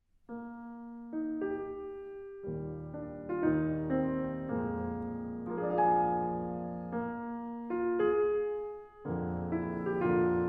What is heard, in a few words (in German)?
Klavier Trio